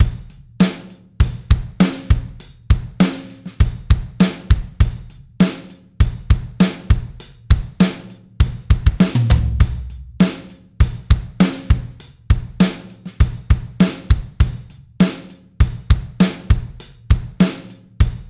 delay manglers dry; aas; objeq delay

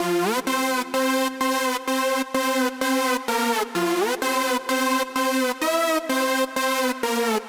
Lead; Massive

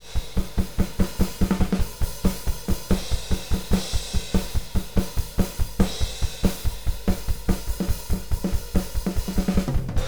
drums; sr40; sr30

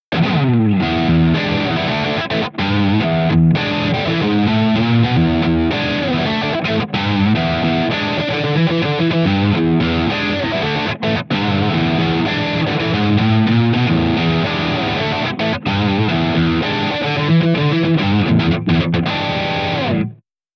Vintage Drive 335 Neck

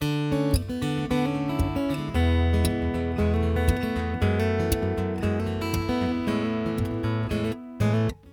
AGt stereo

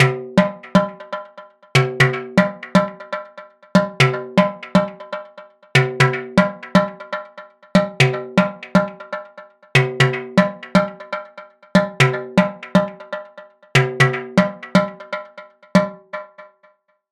delay echoes ghost notes wet; aas; objeq delay

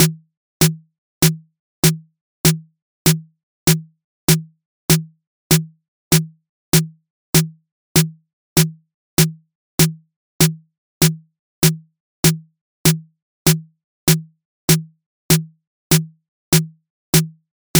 delay snares cr78 wet; aas; objeq delay

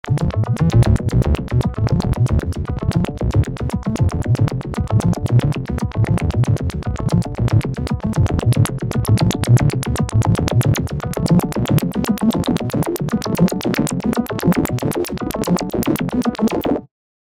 Bass

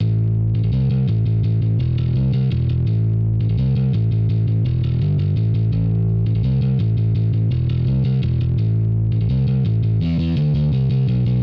Bass mod none

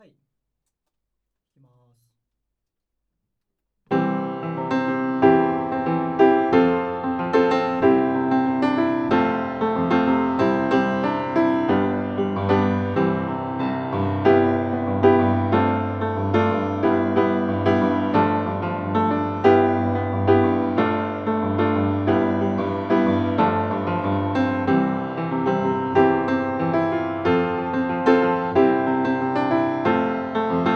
Piano Inside

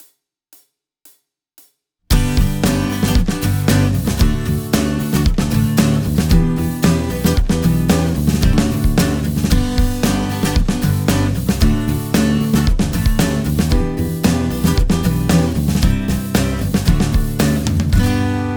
440 PURE; Lewitt; ポップスのためのアコースティックギター録音; C-02

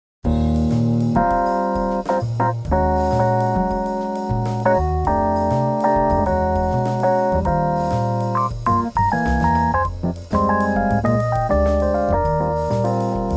EPiano

